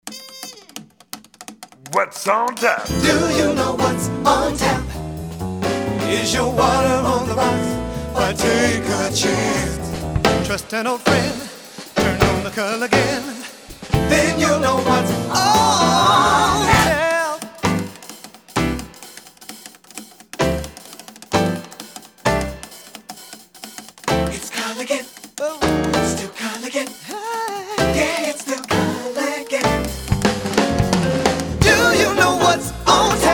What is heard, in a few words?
WATER JINGLE; CULLIGAN